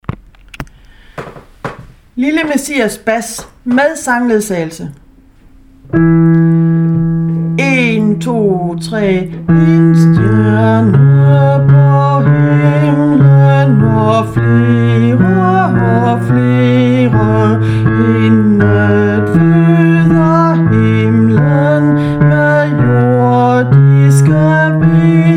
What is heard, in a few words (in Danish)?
bas med sangledsagelse; lille messias